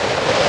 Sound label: Sfx Winter games